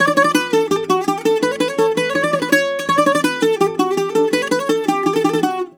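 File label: F#, bouzouki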